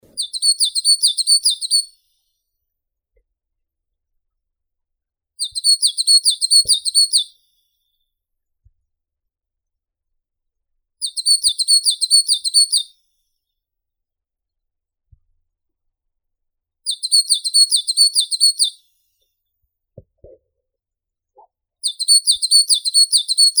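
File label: eastern tit, Parus minor; sinitsa vostochnaya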